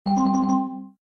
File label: nudge